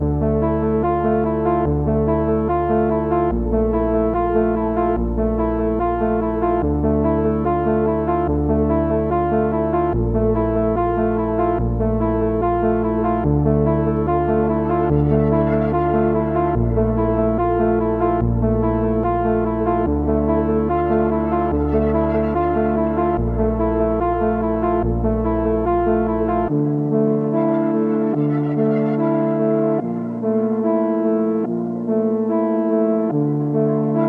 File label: 145 BPM D Min, Melodies; Cymatics; 2022 Melody Mystery Expansion